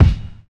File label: steel kick drum sample f key; kick drum samples